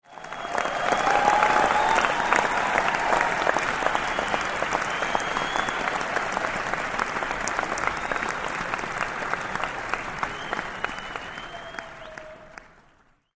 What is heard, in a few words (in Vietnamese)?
Tieng nguoi ham mo vo tay co vu